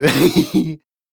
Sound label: Laugh; Warframe; Alad V Boss